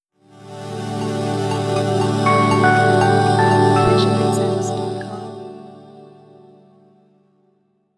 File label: Atmospheric Cheer